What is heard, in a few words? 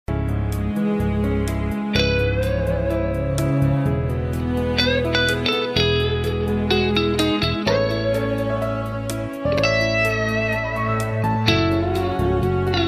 Metal